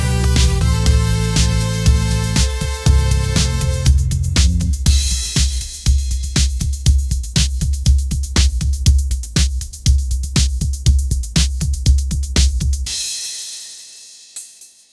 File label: upbeat pop melody, ringtones; ai